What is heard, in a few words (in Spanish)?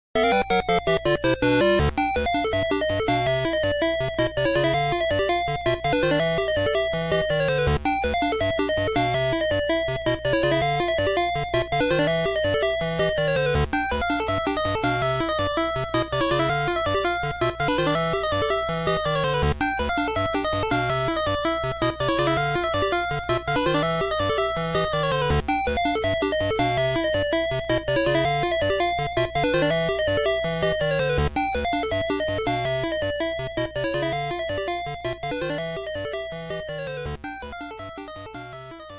Cambio de mapa Pinball